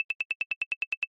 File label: money summary; medallion; Fantasma